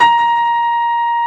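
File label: reverse A#4, piano; AKAI S-Series CD-ROM Sound Library VOL-2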